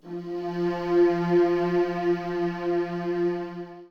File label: pad; ensoniq.gs1